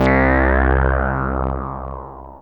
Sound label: BAS, Eurotech; Sounds Good